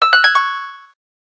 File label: harp, keytone